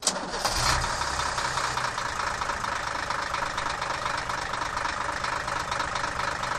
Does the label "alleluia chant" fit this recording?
No